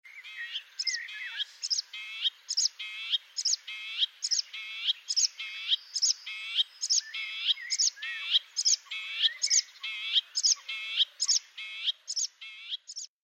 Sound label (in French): Hypolais polyglotte